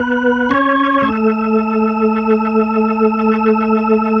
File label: Organ Lick; Ambient Grooves; Organs Licks